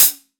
hit

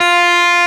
arp; osu

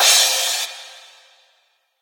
crash

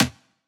tom; osu

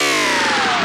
breaks 165

